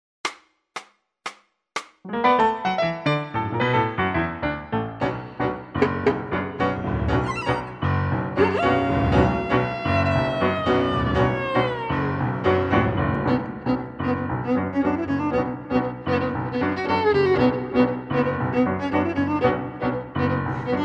piano play referencia violin

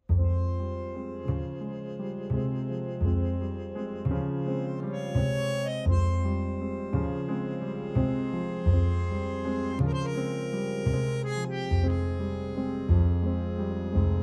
Fm, orch

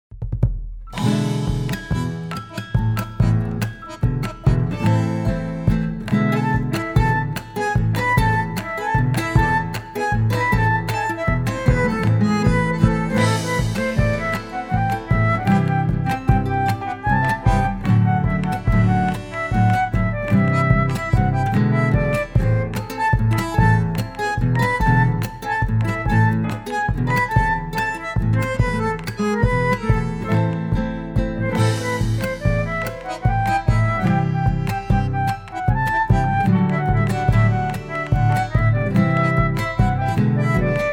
flute, Am

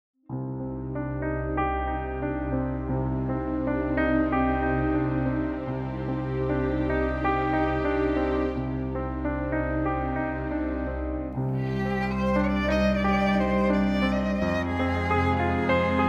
violin play

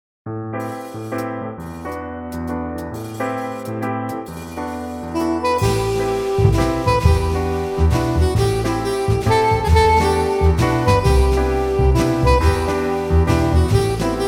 play reference sax

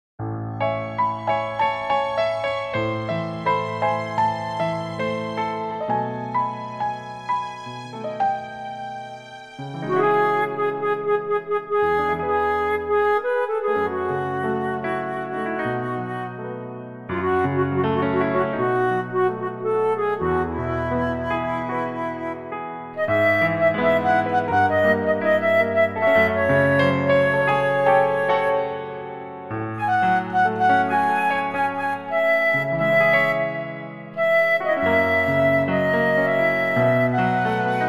E play Reference flute

